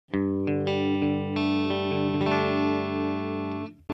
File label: Behringer XM8500 - Clean (Bridge+Neck)